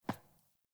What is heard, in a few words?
Boot Walk Metal, Footsteps; Characters; Enemy; Melee Grunt; Boots; Sinecure